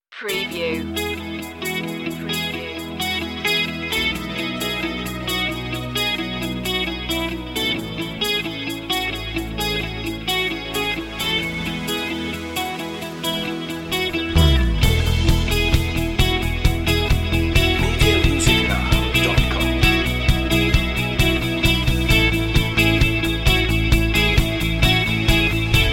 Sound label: inspirational